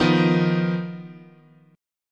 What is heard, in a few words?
Eb Suspended 2nd Sharp 5th, chord